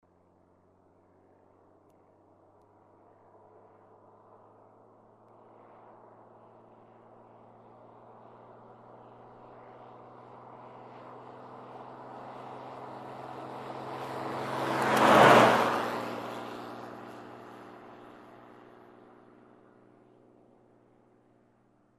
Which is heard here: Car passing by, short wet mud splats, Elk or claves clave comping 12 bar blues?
Car passing by